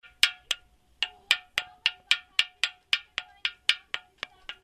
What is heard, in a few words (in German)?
komisches klopfen